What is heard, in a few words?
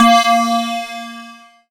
BELL-GONG, A#; Big Fish Audio